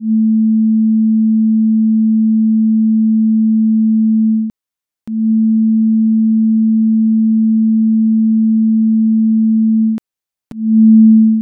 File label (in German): Schwebung